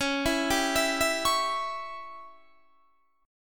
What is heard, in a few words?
C sharp Diminished C sharp down Guitar Standard; C#dim arpeggiated; JGuitar